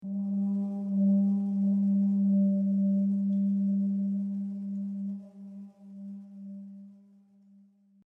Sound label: low g